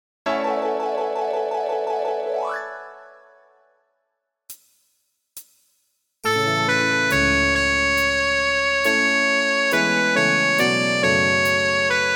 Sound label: sop1, midi; britten ceremony